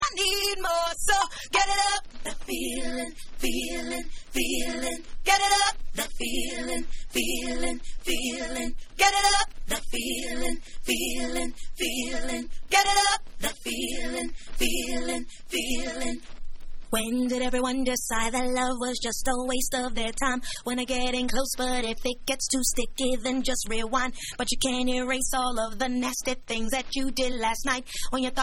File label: Acapella